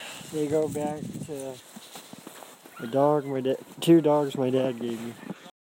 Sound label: dog